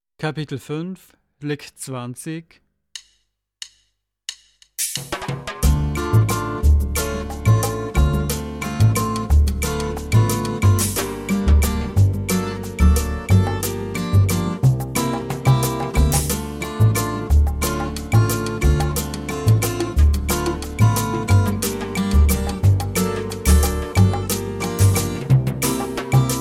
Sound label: Latin Style Guitar